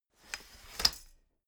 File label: folding chair fold medium